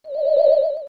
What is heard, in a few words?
ghost; SNES; SMRPG